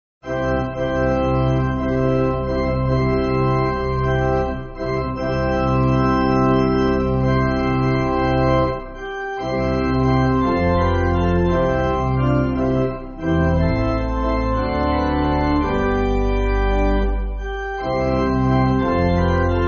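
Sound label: Pipe, Pipe Organ, Music Backing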